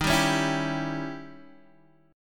D sharp 7th Flat 9th Guitar Open D

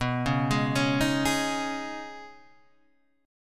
D sharp 7th Suspended 4th Sharp 5th

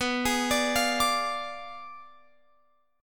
B Diminished 7th, down, Guitar, Open F; arpeggiated

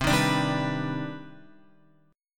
C Minor Major 9th C Guitar 4F,4A,5C,5G,5C,6E; strummed; JGuitar

